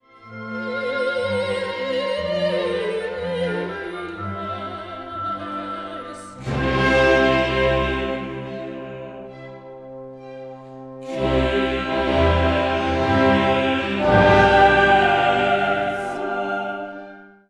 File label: Kyrie Soprano